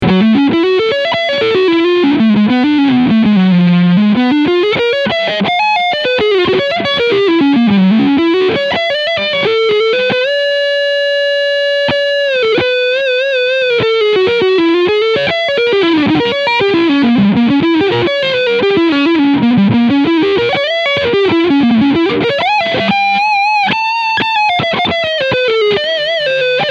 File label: Framus Leadsound Front Pickup; Artist Line